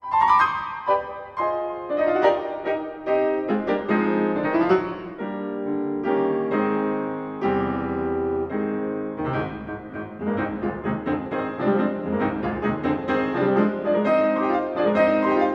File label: Etude Tableau Es-dur Allegro con fuoco